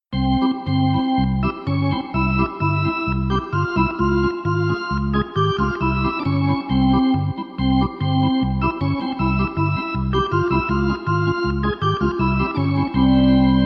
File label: Arena Organ